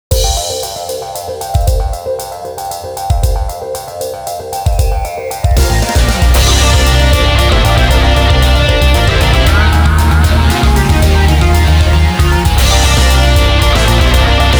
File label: Medley; Game Music; Thunder Ceptor